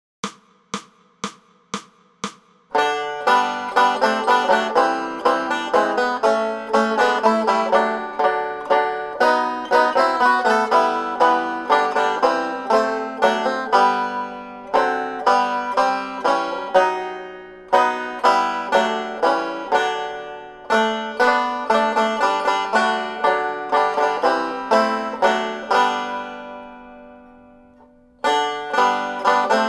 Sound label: Banjo; We Wish You a Merry Christmas; Banjo Tablature with Play-along Audio Tracks